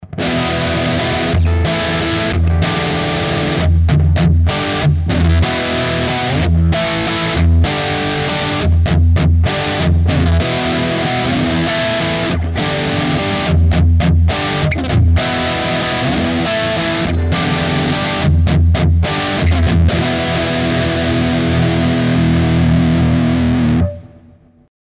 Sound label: Framus Diablo Pro Bridge PU split Highgain Rhythm